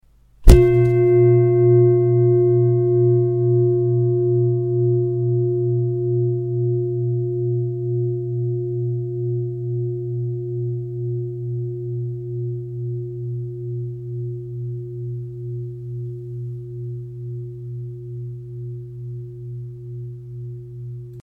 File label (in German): Klangschale